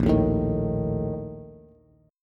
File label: A sharp Major 7th Suspended 4th, Bass; strummed